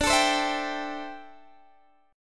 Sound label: D 7th Suspended 2nd Suspended 4th, Guitar, Open G